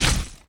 step; Helroid

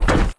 step

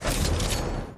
Robot idle

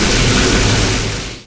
Beam Loop

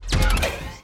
Robot idle

